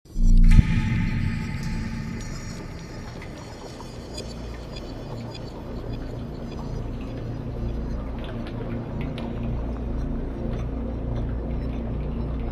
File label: basement; Submachine 4